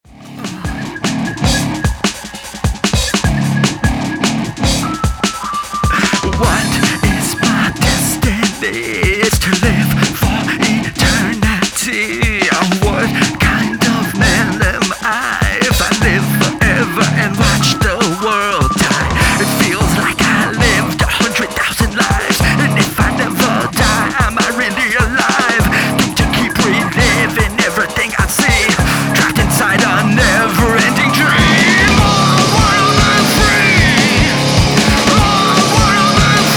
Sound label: Nu Metal Sampler